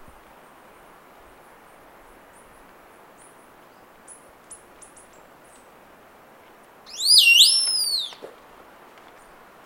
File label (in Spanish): Guardabosques oscuro Lipaugus fuscocinereus